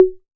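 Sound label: 808 high conga, Tape; Tape808; Goldbaby Tape808 & Tape909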